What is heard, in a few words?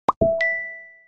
Message